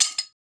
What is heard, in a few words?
metal falling